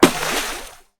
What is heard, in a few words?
Bullet hit water; Hovl Studio; SFX Battle sounds; WhiteMan Unity2D